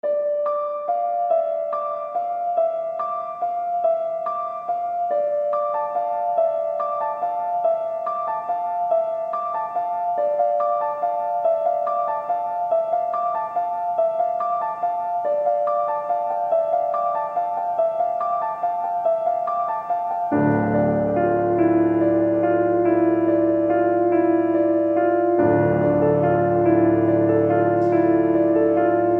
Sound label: suspense piano